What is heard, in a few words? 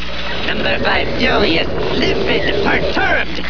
pain; alienarena; johnny5